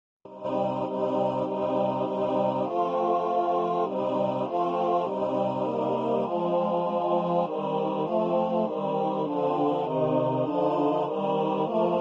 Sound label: coro; sala ensayo